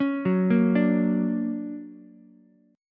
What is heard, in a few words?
D Augmented Major 7th, down, Ukulele; D+M7 arpeggiated; JGuitar